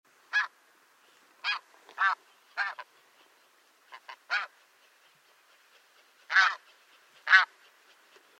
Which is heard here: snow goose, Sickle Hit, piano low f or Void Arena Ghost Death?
snow goose